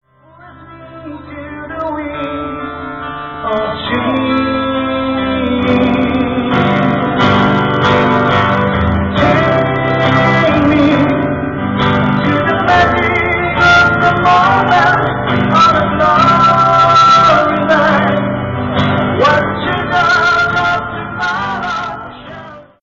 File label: winds